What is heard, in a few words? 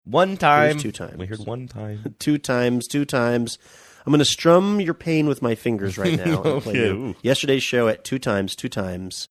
making spoof